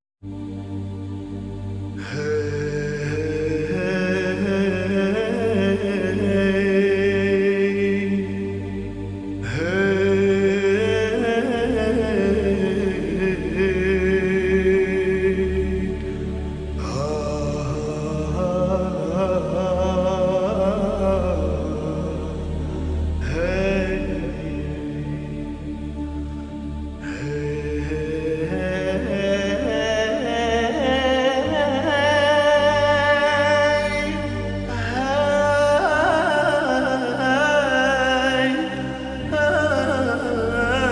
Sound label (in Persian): Vocal Gham